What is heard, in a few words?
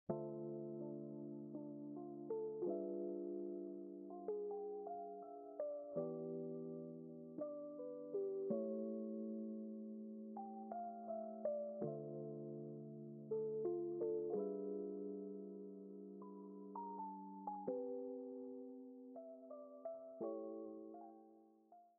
pause loop; boop 2.0